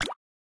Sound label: start; Evoplay